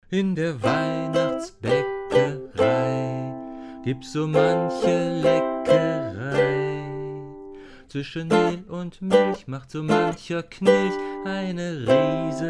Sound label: MOTU M6 Ukulele Voice